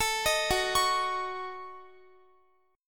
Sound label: Gb Minor 6th, Guitar; Gbm6; arpeggiated; x,x,19,20,18,20